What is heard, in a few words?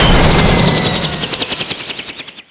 heli shutdown; Modern Warfare